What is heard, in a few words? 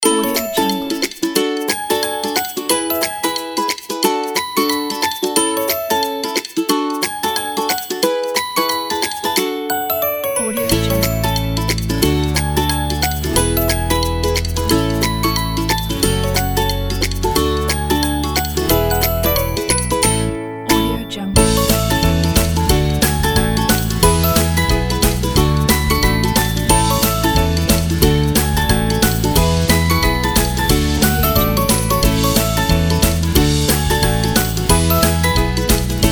happy ukulele